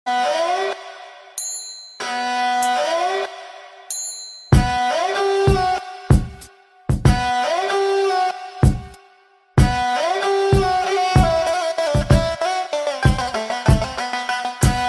trap ringtone

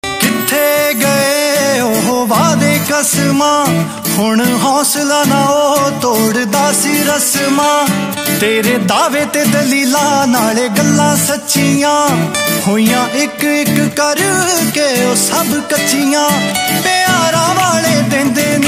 Punjabi ringtone

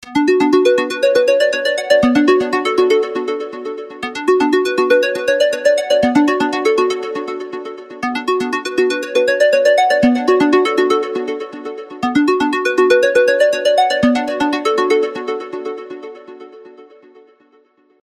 samsung galaxy j7 max ringtone

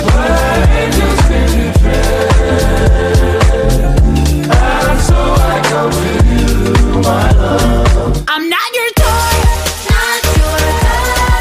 hot electro ringtone

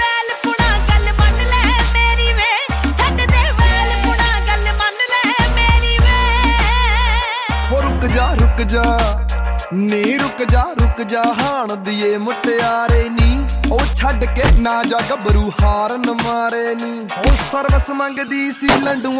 punjabi ringtone

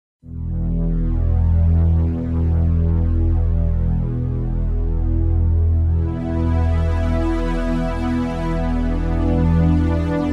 medley mashup cover

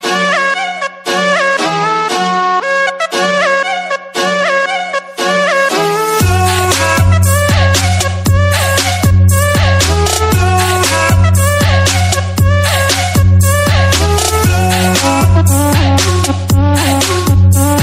fast arabic trap ringtone

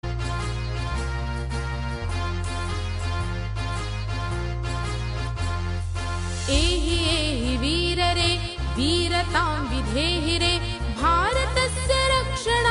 sanskrit ringtone

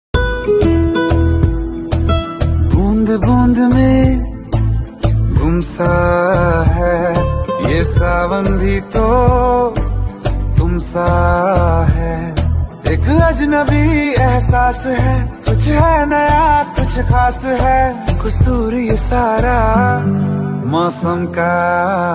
latest bollywood ringtone

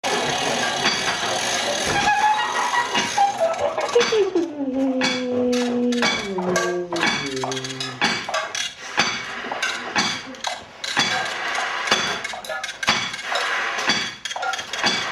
belgium ringtone; louvainla neuve

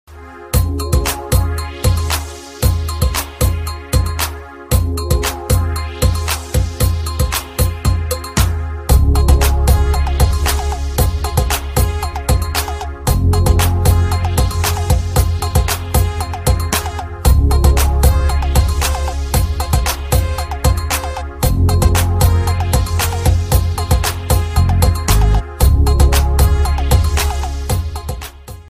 crystal clear tone ringtone

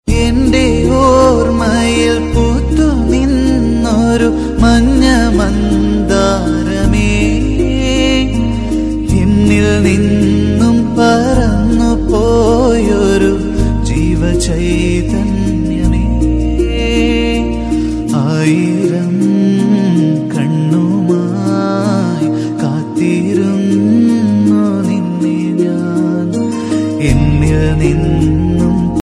malayalam dj tune ringtone

